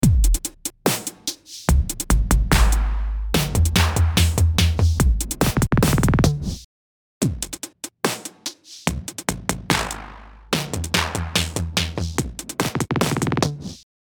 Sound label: Bright Tube